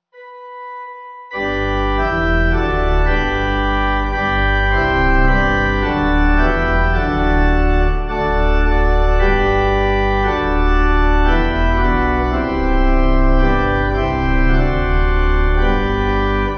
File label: Morning Service The Offertory Pipe